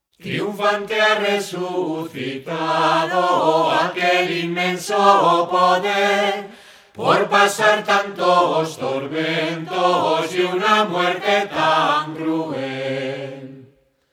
canto para la procesion del encuentro domingo de pascua

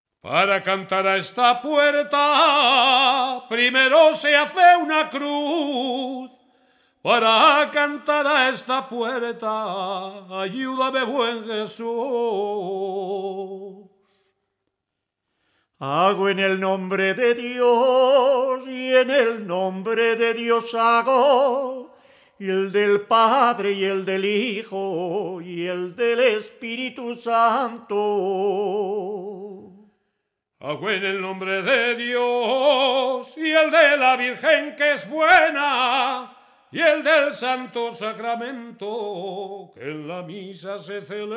coplas del reinado de navidad

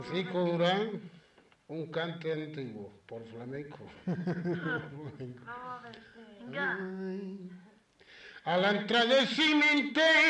andalucia a la entra

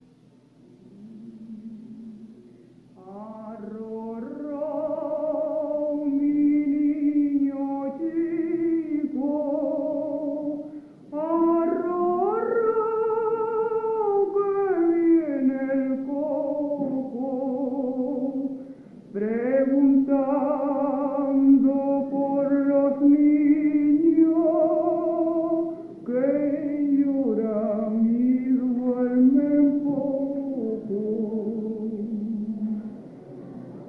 canarias arrorro

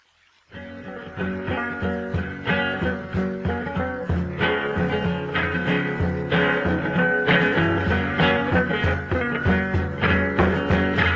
aragon tarantella

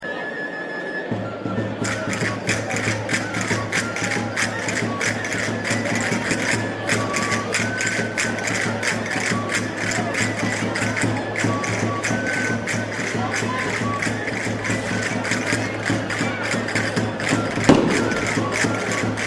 danza del pie negritos, toca

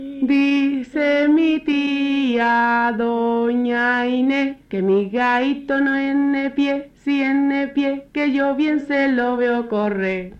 andalucia, dice mi tia

